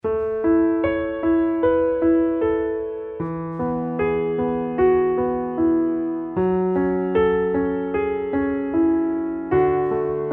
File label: Underscore PIANO; Worship Flow